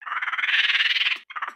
Pets Skunk Idle Bored; creatures; Lego

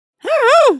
Shrug

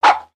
Pet Dog Small Alert; creatures; pets; Lego

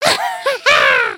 excited, emotes

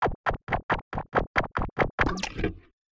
Pet Doberman Beg; creatures pets

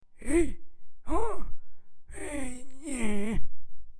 Old Man Idle; dialog

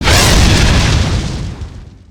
Dragon Flame Roar; creatures organic

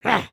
Run; Lego Strombie; creatures organic

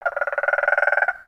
Pets Tortoise Happy; creatures pets; Lego

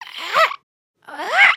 stretch, emotes

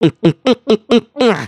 drink

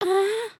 camera picture; emotes

